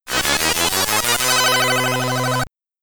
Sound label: Name Entry, SID Stereo; Go Kart Simulator; Commodore 64